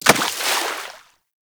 Melee Impact Water; Warframe; Weapons

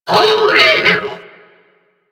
Sentient Pain, Enemies; Warframe; DE; Sentients; Ortholyst

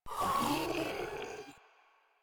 Infested Ancient Vocal Pain; Warframe; Proto Infested Ancient

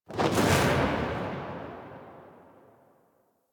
Spear Tail, Weapons; Warframe; Tenno; Priest Spear

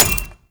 Sticky Bomb Proj Land; Grineer; Veilbreaker

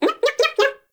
baby vocal

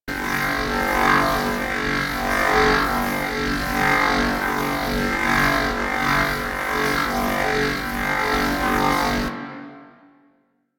Magnestized Loop; Warframe

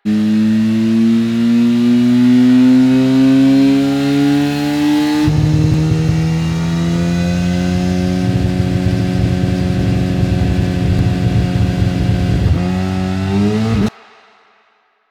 Engine Rev Up, Motorcycle; Motorcycle Ninety Nine

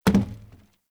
Wood Stool Impact Light; Objects

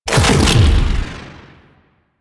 Proj Perfect Pulse Impact; Warframe; The New War; Quests; Weapons; Omicrus

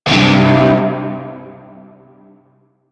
Shawzin Chromatic Chord; Warframe; Narmer Shawzin

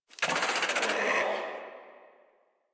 Infested Charger Idle; Warframe

